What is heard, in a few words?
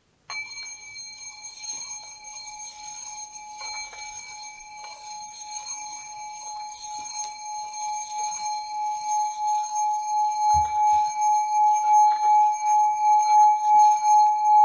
singing bowl